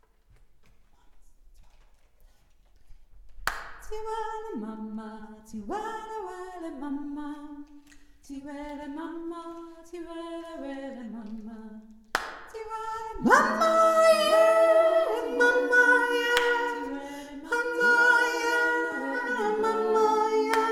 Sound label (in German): hohe stimme